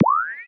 Menu Scifi Enter

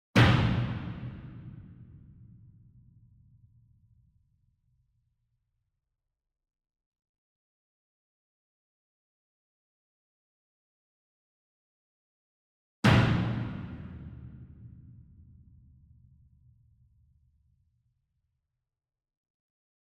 SINGLE DRUM Verdi 66 Inch Hit Hard Beater Far; boom library; Cinematic Strikes Construction Kit

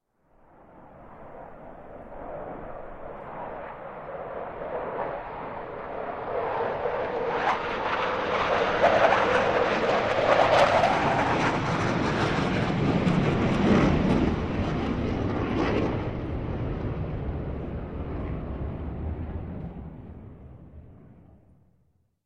F-16 Pass Overhead; Sound Ideas; Series 2000

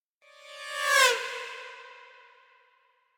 Horn Trumpet Doppler By - Ominous Passing; Hollywood Edge; sound designer's toolkit v2

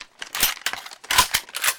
Gun Reload Weapon, Weapons Foley; SFX Kit

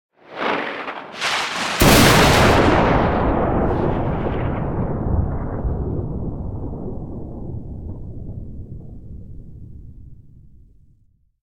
Thunder Clap - Heavy, Longer Tail; Hollywood Edge; sound designer's toolkit v2

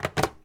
Phone Hangup; SFX Kit; Foley - Misc

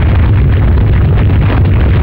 Quake Rumble Loop; Destruction

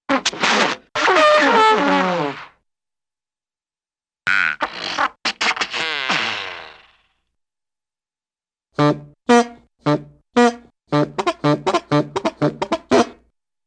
Bugle - Short Sputters Musical Saxophone Honks; Cartoon; Hollywood Edge; Cartoon Trax

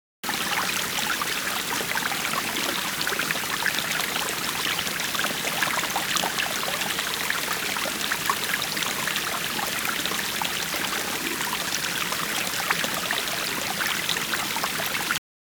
water stream medium; best service Blue Box; water streams

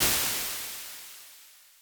480 Plate, Impulse Responses; Reverbs; PastToFutureReverbs; CLA; IRs

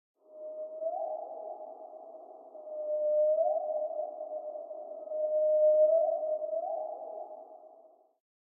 Jungle Bird Calls - Night Triple Call Reverb; Hollywood Edge; sound designer's toolkit v2

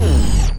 Scifi Dome Shield Deactivate; Energy & Forcefields

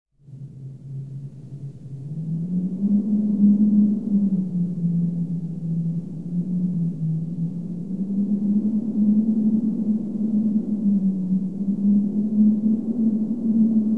Weather Wind Hovling Lightly; KMP Film Video Sound Effects

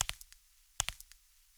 Tile; blindside

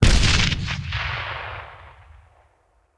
Grenade Hand; Sound Ideas; Guns

